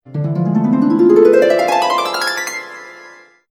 harp; DuckShooter

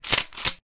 shotgun reload; DuckShooter

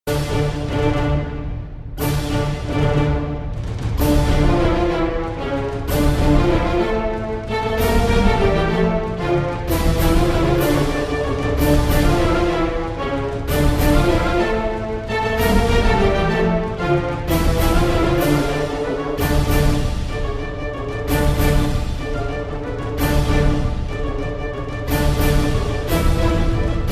menu; RobRunner

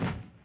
shooting; Alpha-E-Corp

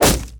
enemy damage; Hold Em

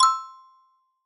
get money; Alpha-E-Corp

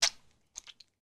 blood spill; Zombies Night

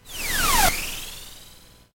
tron blast; EggboyJump

